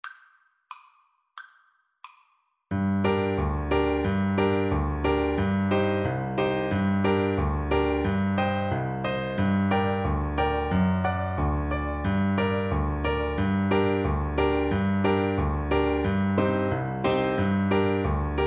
Trombone

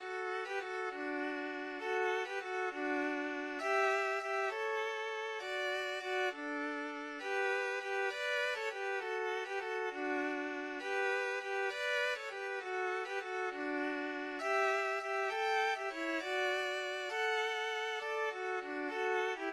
violin duet; silent night

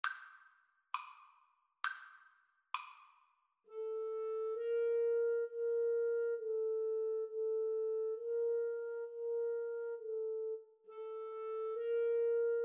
Flute Clarinet Duet

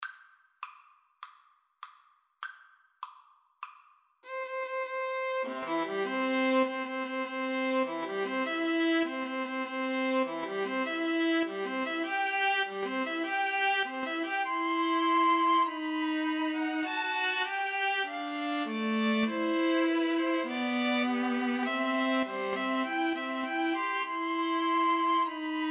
wedding march, String trio

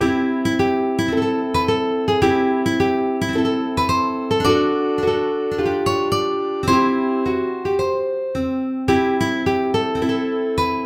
UKE

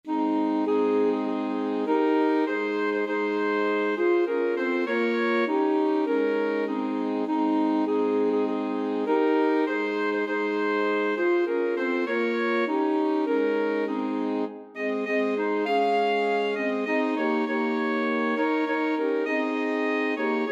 christmas trees, alto sax quartet

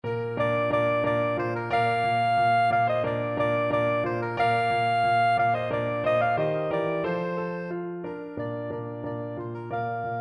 spring soprano saxophone

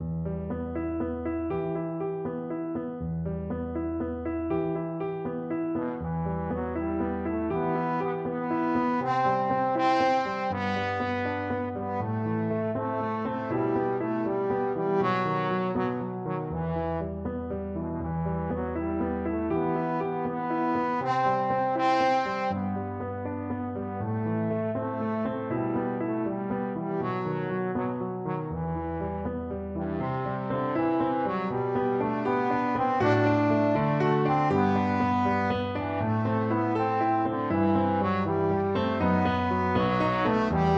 Vltava TROM, trombone

